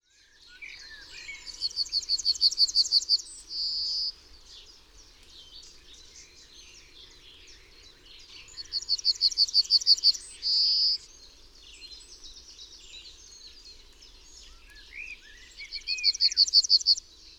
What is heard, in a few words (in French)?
bruant jaune